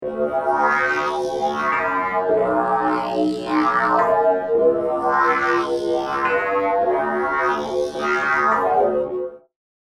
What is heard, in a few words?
serum synths; chiqui audio